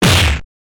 Hit 8 Bit

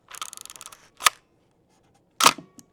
Film Camera Click Shutter

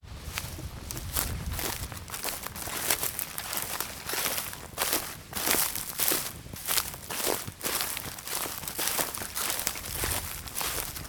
Stubble Walk

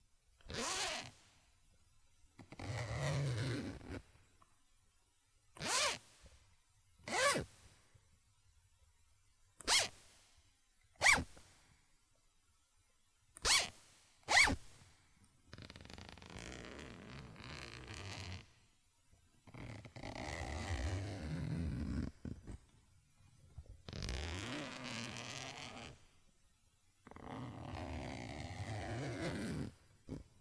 Zipper Zip And Unzip

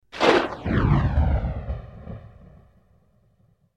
Thunder Claps Single Clap

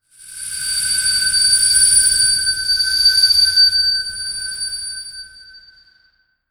Magical Whistle

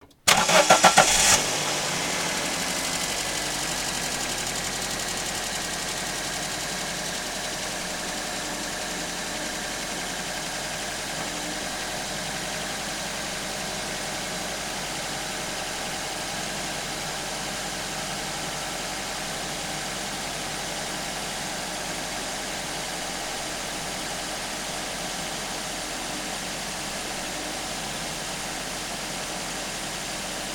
Car

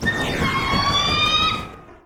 Eagle

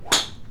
Golf

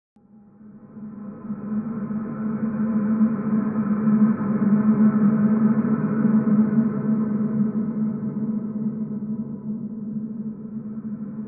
Dark Emptiness

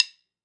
Drumsticks

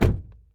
Car Door Closed

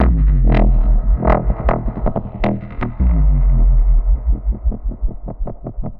Drum n bass Bass Loop 160 Bpm

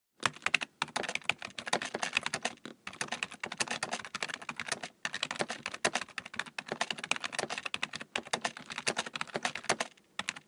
Keyboarding

